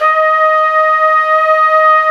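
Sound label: WND, Hybrid Winds, Flute & Flugel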